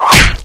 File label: Sweeps Short